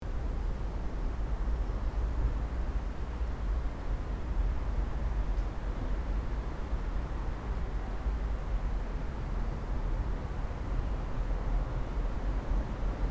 Sand dunes gentle wind ambience

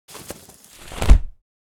Small umbrella open

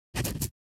Scribble signature on paperwork

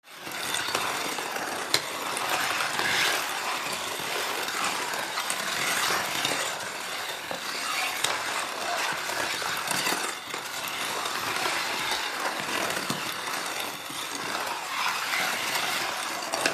Ice hockey player skating movement

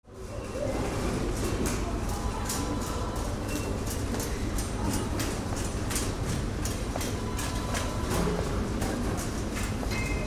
Supermarket ambience interior perspective

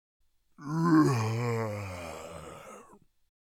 Orc creature yawning

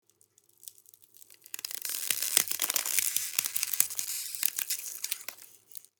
Clean ice cracks

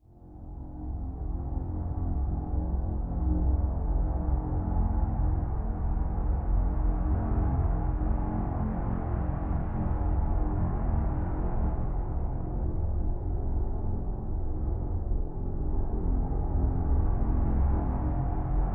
Dark horror drone suspense and eerie

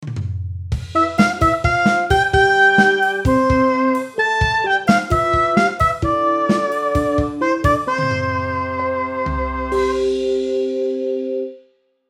Sitcom theme music intro outro

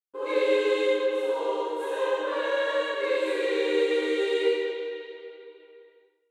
Angelic choir angels; wings of serenity

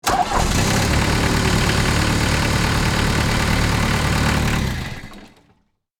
Forklift engine ignition and shutdown; construction vehicles

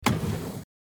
Small drawer opening; household

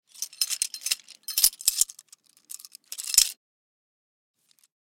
Prisoner handcuffs movement handling rattle; police

